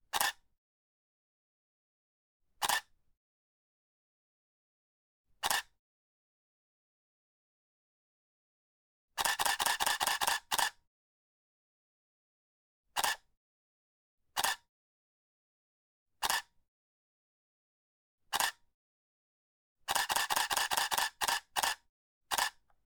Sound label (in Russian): zatvor kamery